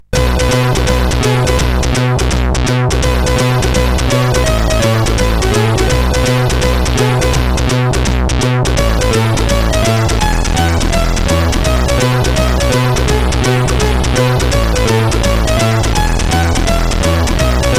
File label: Reggae Example sid CSG8580R5